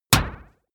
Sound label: sci-fi metal hit wobble; whooshes rises; hits impacts